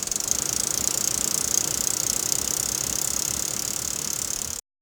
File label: bike screeching to stop